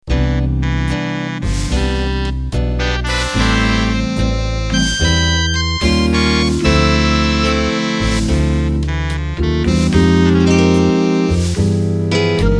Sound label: Slow Jazz Intro; #s jzz 1.sty